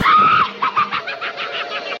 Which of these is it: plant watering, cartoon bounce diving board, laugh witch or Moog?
laugh witch